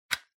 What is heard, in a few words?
PC Hardware USB Unplug